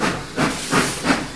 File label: steam engine; SteamEngines